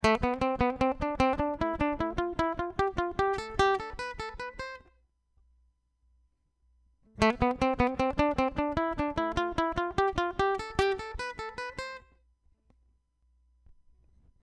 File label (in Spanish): C Escala Tresillo